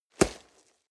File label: nylon leather hit wet